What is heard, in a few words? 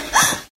Grunt; Lewd SFX